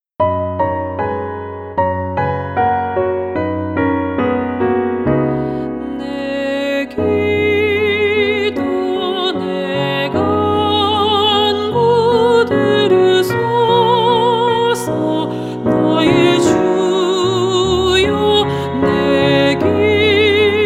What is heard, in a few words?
SOPRANO